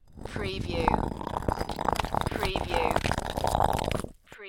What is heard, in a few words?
Rock Grind - Scrape Slide Rattle